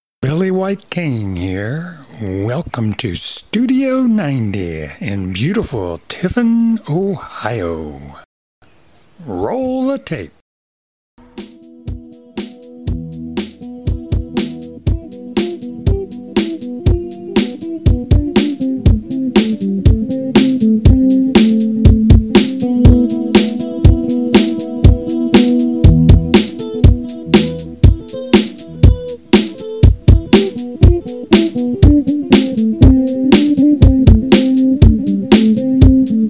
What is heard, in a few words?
covid blues